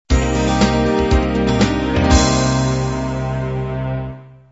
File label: soft beat end